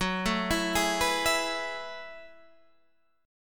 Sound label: Gb Minor 11th Gb down Guitar Half Step Down